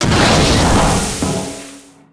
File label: explo destr droid, impacts explos